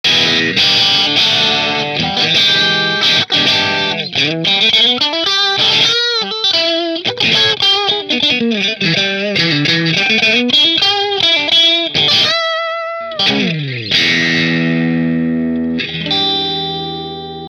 Sunburst strat position 4 marshall, Fender Stratocaster Sunburst 20th Century American Standard